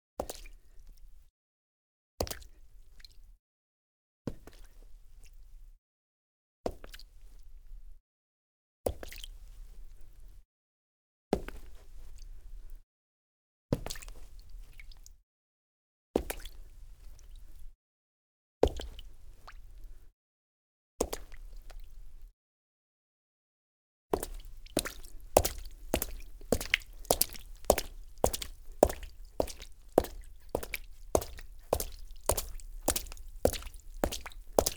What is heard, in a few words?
Cement Wet, Foley Footsteps; Sound Ideas